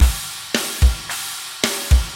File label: metal